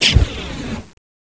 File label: flamethrower burst; Gunlok